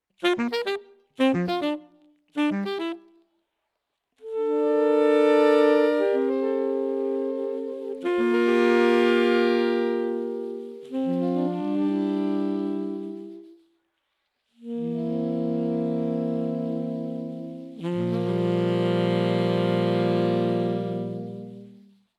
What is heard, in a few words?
4 note delayed; harmonizers; Delayed Harmony (Saxophone); Meris Hedra 4-Note Chord; Pitch Manipulation Pedals